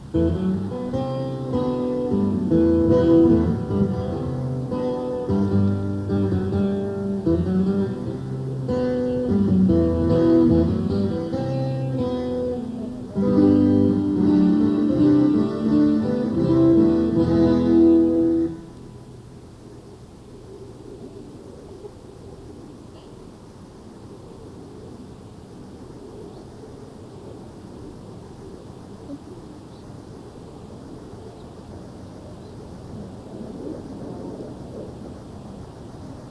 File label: soundcheck